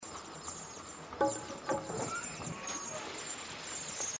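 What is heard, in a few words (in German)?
Übergang drinnen draussen; inside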